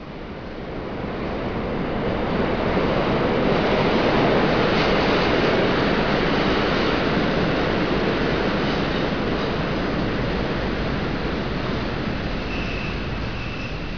Train Arrive at Stop

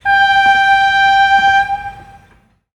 train whistle

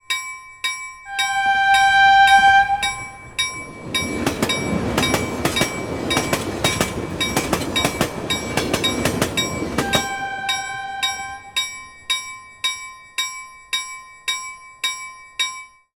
train crossing bell train crossing fast

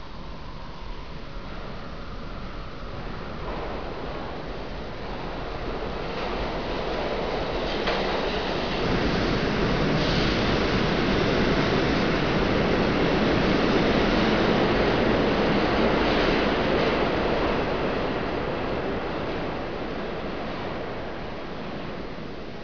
Subway departing

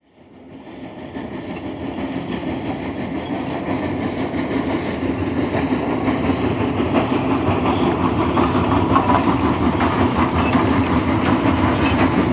steam locomotive pass by

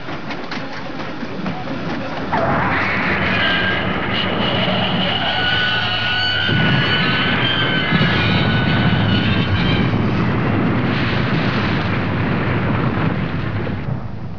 Diesel train crash

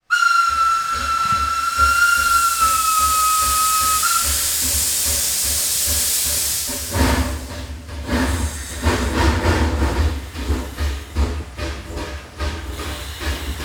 steam locomotive whistle and depart

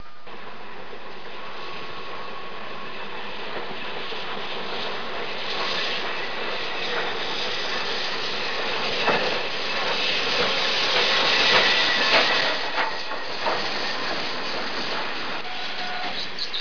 Train pulling into station

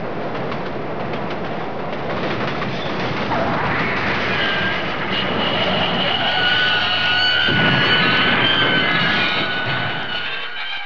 Subway crash

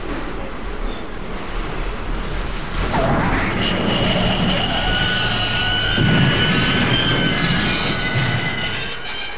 Subway train stopping

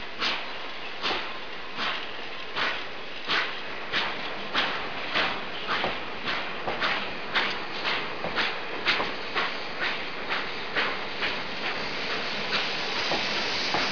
Steam locomotive leaving station

